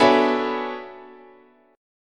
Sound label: B Minor 13th, guitar chord